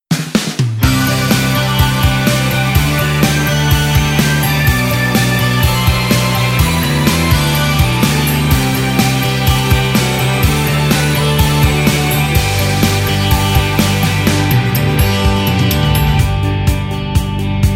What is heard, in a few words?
Off Vocal